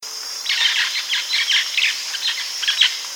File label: Scaly headed Parrot